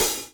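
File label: open hi-hat Acoustic Natural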